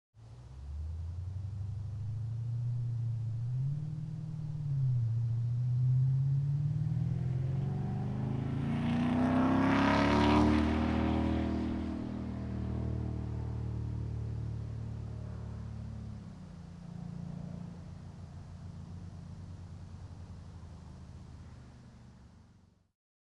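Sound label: jeep srt8 exterior fast by distant; fvtt sonniss gdc audio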